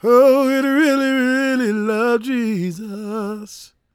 GOSP MALE, Vocal Planet Gospel

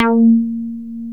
SUB BASS

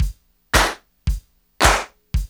LOOP, DRUM; MLOP DRUM; E-Lab; X Static Goldmine 2; akai

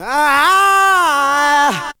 VOC; ZERO-G; Datafile

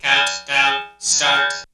SFVX

